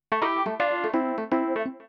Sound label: organ house 125 A; eJay; Keys